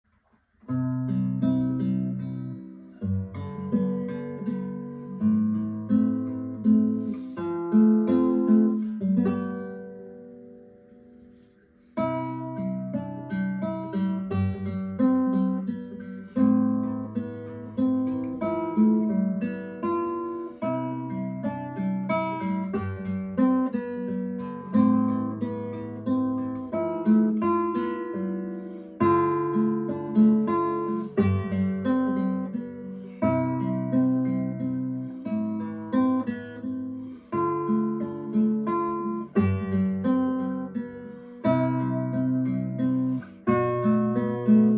romance easy guitar piece for beginners

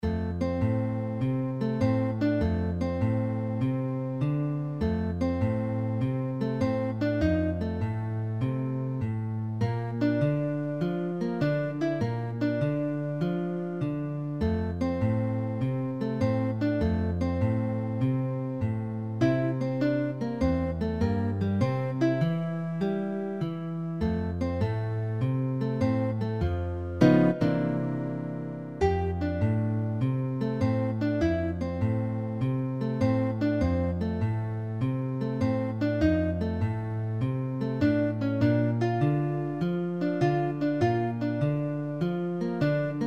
etyud shaffle blues, guitar

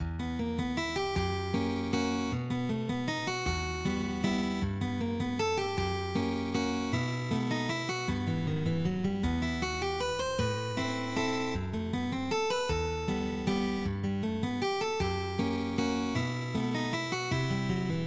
vals, guitar

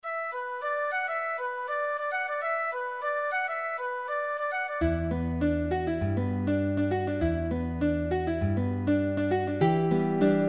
grustnaya samba, guitar

